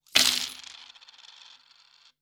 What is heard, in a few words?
coins table